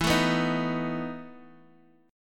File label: E Major 7th Suspended 4th E down Guitar Open D